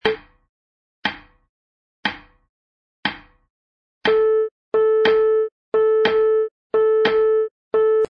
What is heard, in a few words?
shuffle